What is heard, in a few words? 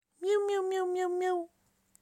miau